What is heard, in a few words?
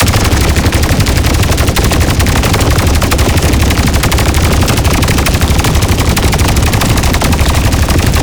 Hellfire Projectile Loop; Enemies; Abilities; Warframe; Tau; Roathe